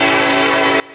Horns, Trains; Nathan K5LLA; Short

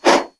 Chuff Loop, Steam Trains; PFx Brick

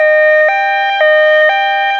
EU Siren; Transport-Road

